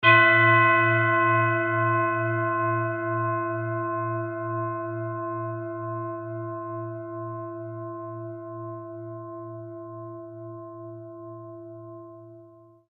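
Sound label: tibetan bowl moonset, A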